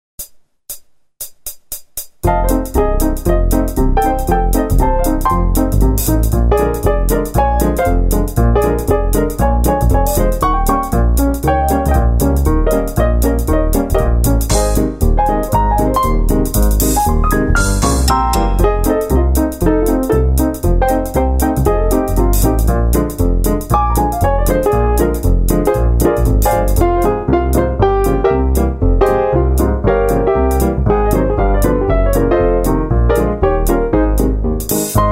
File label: Jazz; MyVirtualBand